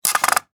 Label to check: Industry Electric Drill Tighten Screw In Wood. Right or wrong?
Right